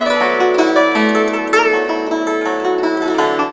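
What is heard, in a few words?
guzheng; Spectrasonics; Heart of Asia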